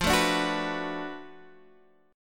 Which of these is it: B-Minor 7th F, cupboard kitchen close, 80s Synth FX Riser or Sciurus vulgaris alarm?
B-Minor 7th F